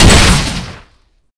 Launch; yuri

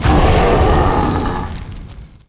dragon; lasvegas

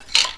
Crossbow Open; Weapons

